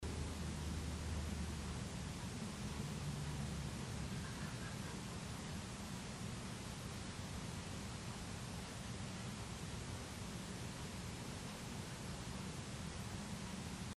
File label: female laugh empty room childrens area, used books comics; Gardner’s Used Books & Music; Tulsa, Oklahoma